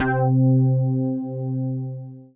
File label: Dance Floor Bass, Multisample Basses